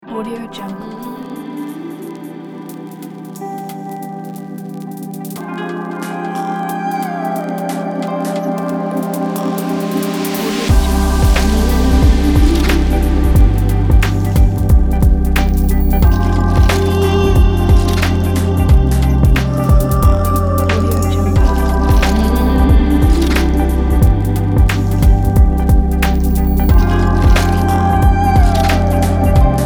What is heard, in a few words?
ambient dream presentation build product